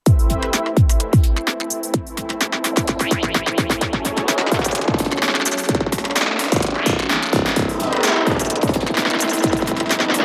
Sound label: Channel Send Tape Delay